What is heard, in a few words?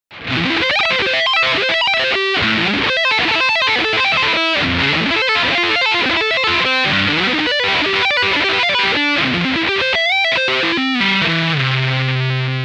Metal, gitar